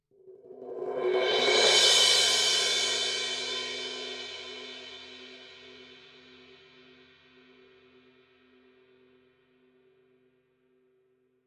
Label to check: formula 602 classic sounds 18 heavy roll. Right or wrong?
Right